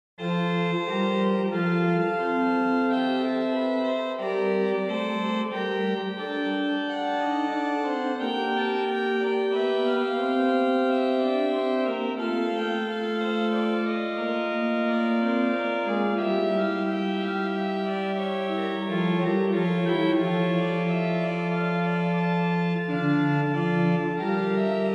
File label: Trio, organ; Livre Souvigny